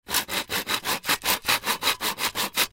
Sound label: Saw Wood 2X4 Plank Fast Cut; Saws; Machines; Industry